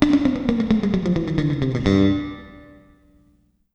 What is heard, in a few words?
Bass, 130 BPM; Sampleheads; Ultra Freakin' Bass